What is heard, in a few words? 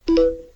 Phone Notif